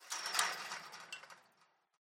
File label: ambient chain link rattle, metal